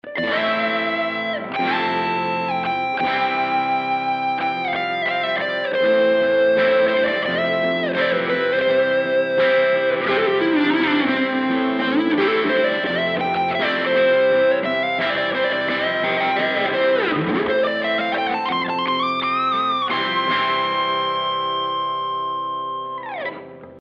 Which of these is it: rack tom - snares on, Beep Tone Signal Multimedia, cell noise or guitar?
guitar